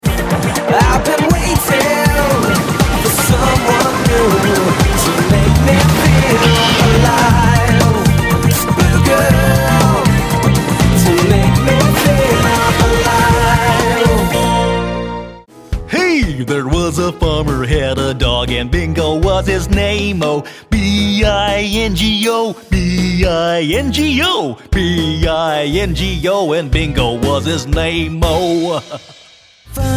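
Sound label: British English, songs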